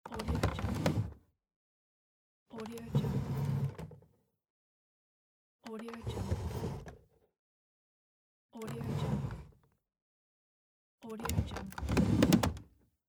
computer monitor height adjustment; mountainaudio

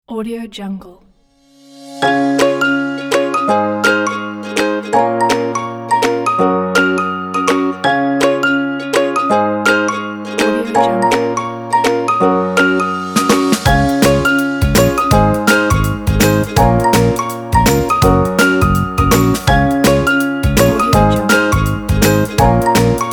happy positive joyful ukulele music for kids

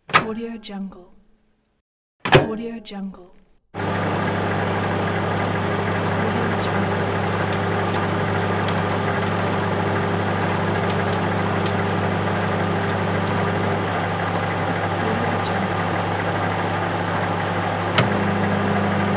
microwave

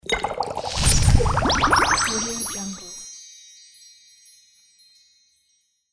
alchemy sound

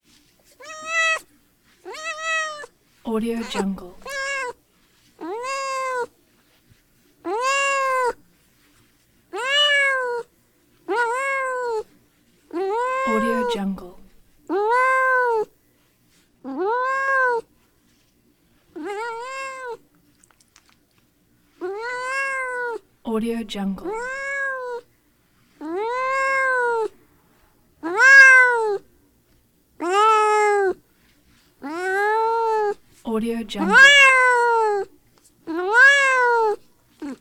cat meow small cat or kitten

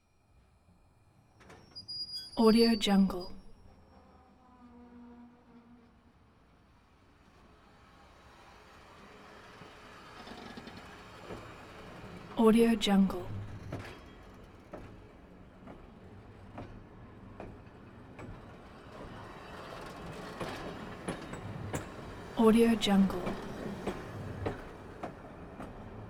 train out over microphone; collanderson

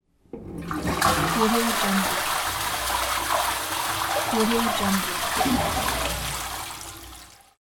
toilet flush; lazyboysproductions

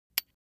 object metal small impact soft damped; smartsoundfx

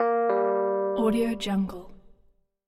design sound tannoy chime

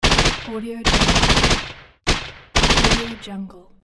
machine gun fire

